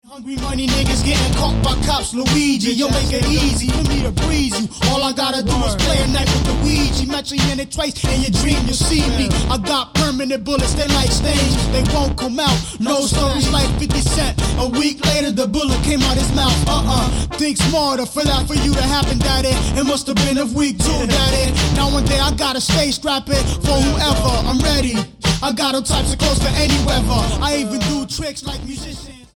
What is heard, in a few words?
Clap